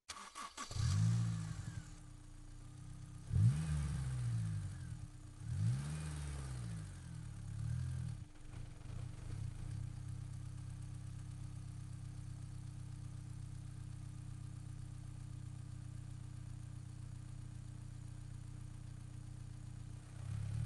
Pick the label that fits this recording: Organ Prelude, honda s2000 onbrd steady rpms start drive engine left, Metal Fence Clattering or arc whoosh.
honda s2000 onbrd steady rpms start drive engine left